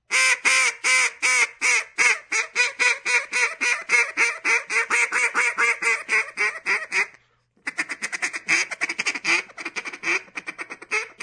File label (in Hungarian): kacsa; vadhivosip